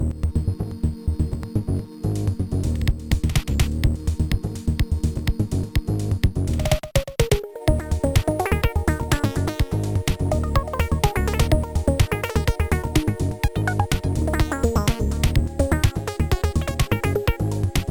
chiptune

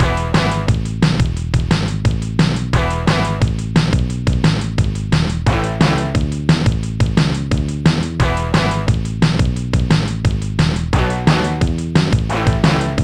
blues mix; Sonix Music Driver

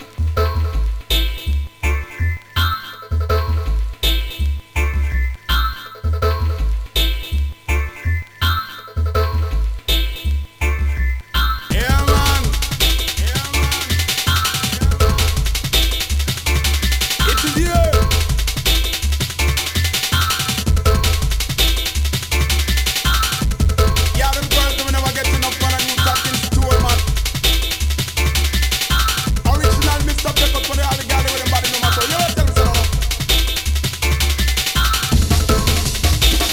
jungle reggae, mod

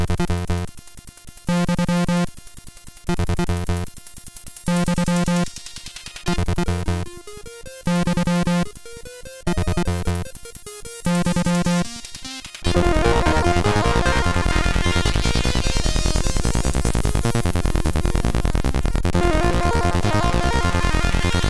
Fart in Elevator, sid MOS6581R3

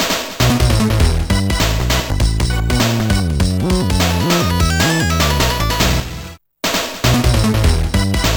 beatbox; pinball dreams; Blaizer

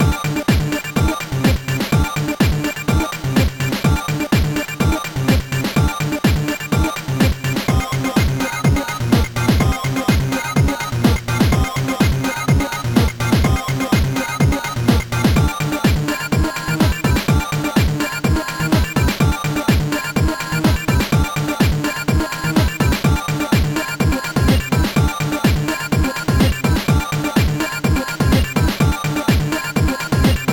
trance; HI-LITE

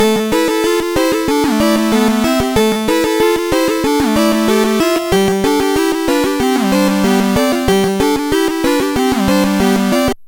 chord song; Trackers; Modland

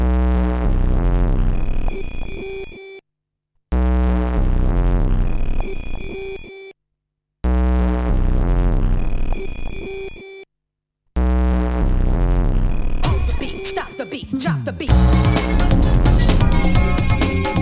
robot vs acid jazz

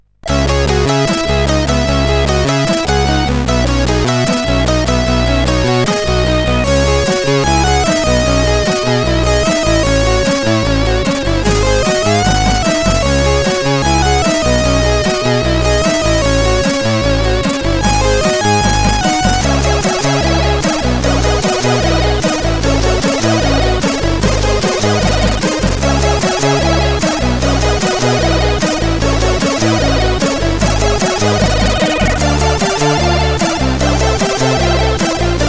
sid CSG8580R5

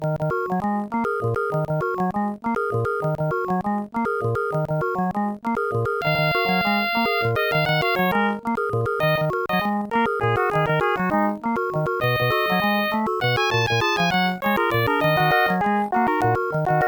fivefour song; The Music Studio

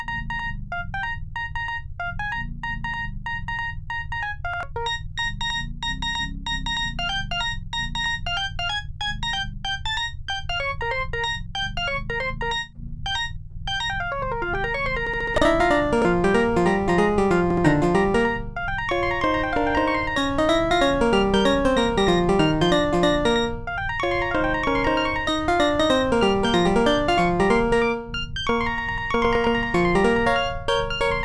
bebop; The Music Studio

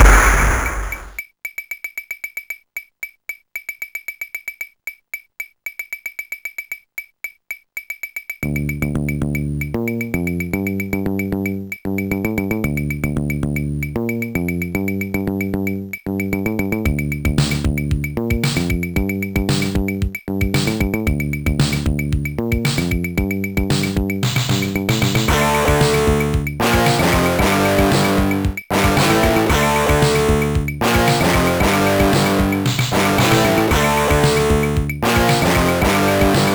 morse alt; Sonix Music Driver

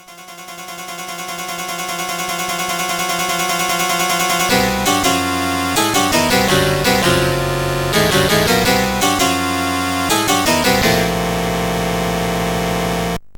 jingle; MED; Addams Family

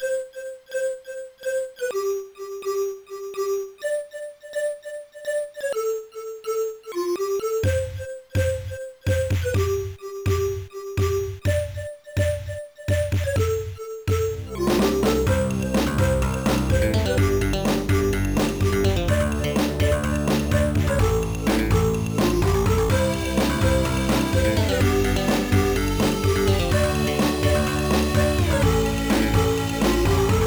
Rock-A-Pipe; MusicMaker V8

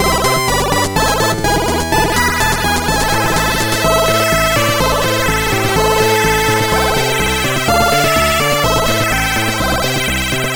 metronome ticking; Beathawk

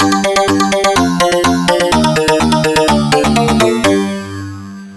death jingle; Prophecy - Viking Child

